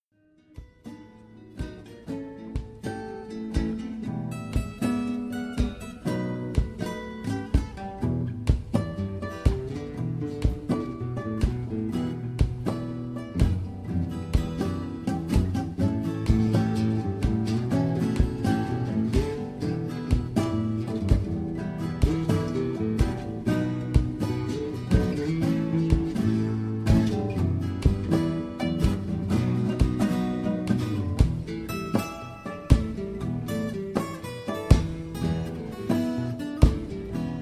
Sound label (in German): Trio Blues Fernfahrerblues